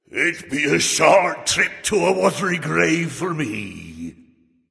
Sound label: death; smite; Vulcan